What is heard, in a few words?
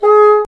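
wind bassoon G#; Miroslav Vitous - Solo Instruments VOL-1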